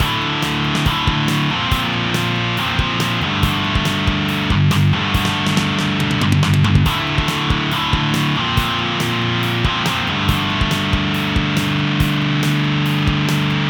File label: drive fuzz